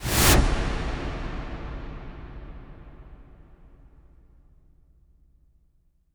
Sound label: IMP WIPE, Cinematic Impact